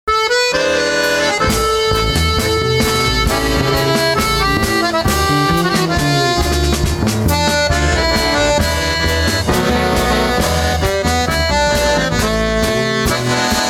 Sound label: Tango